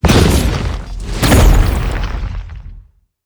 Monster; Zenless Zone Zero

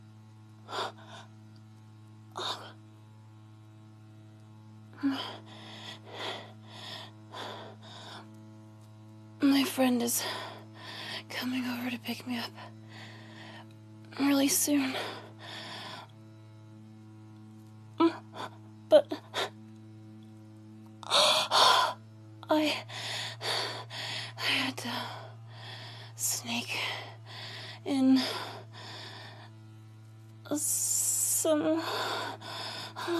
Hitachi lady noises; [F4A] Sneaking in a Quickie

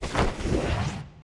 Monster; Zenless Zone Zero

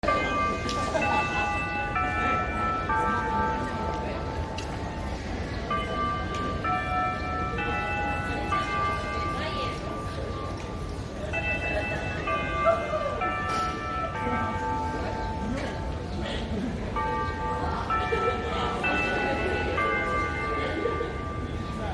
Japanese School Bell Chime with Student Sounds

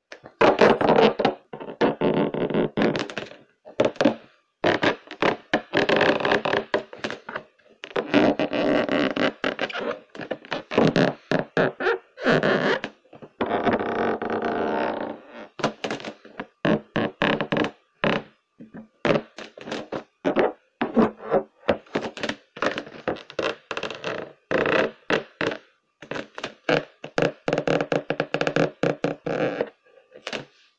balloon creaking asmr

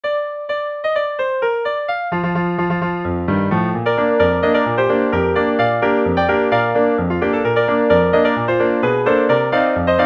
Polka Ragtime Piano Cover; Pony Music Archive 20.03

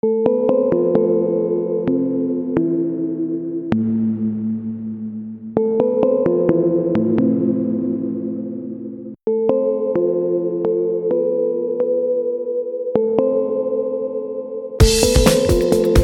modular thinking

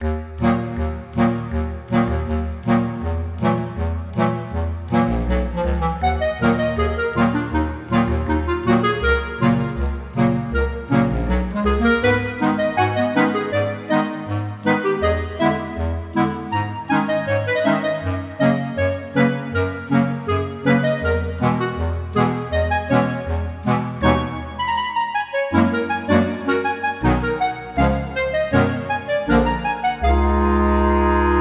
random clarinet piece

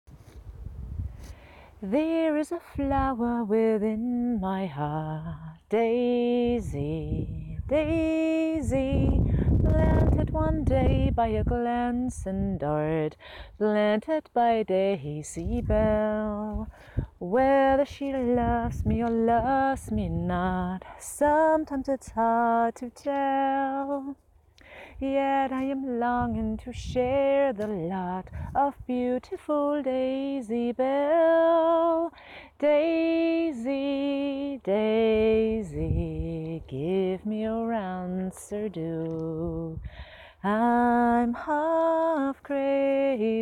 Acapella Singing; Daisy Bell; Bicycle Built For Two

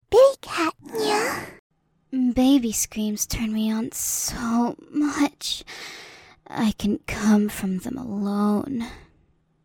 Baby Screams

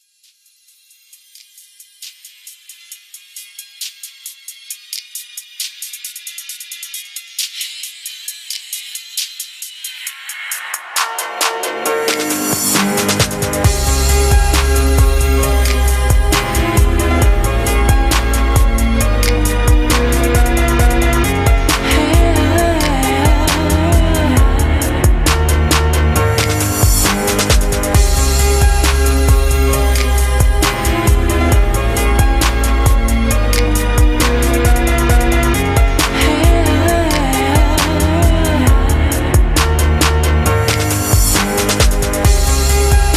beat

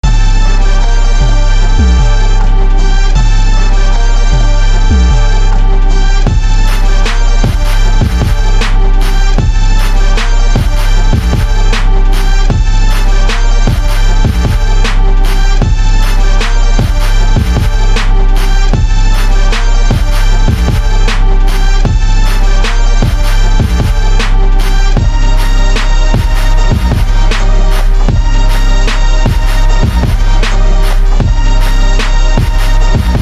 TRAP

